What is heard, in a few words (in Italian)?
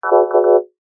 ciottoli stat; acque